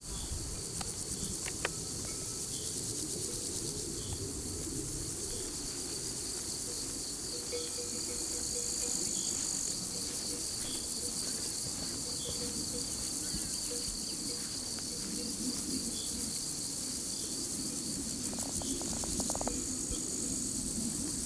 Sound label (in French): Calme, Soundscape